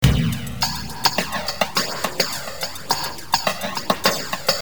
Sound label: depressing rock music